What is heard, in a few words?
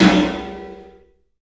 PA DEEP R, CLUB SN VRB; 3D Sound; 3D Drums